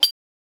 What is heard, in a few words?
futuristic click sound, digital